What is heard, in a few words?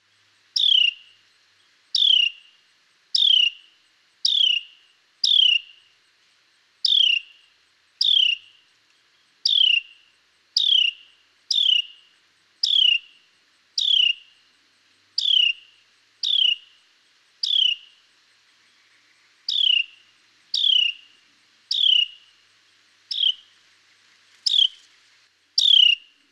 Carolina Wren Thryothorus ludovicianus